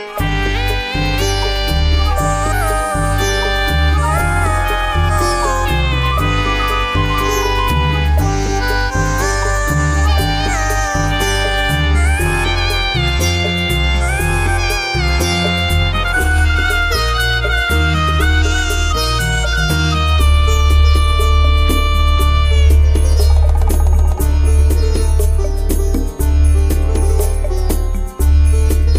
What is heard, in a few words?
Egyptian Flute